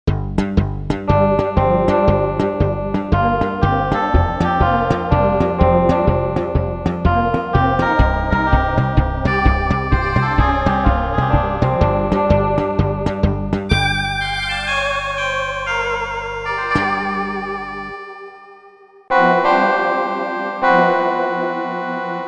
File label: Bass & Chord, Korg MSC-14S; Korg MSC-14S Sound Effects